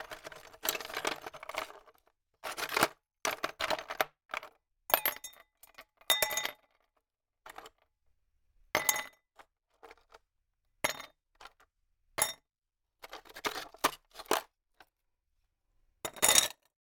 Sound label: Putting Ice In A Glass